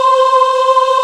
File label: voices aahh; Sound & Vision - Gigapack I CD 2